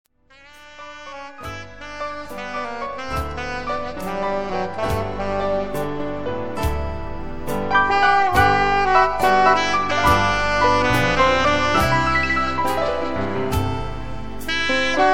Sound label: sax; jazz standards